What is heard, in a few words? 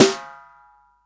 SD, REAL DRUMS, SWING KIT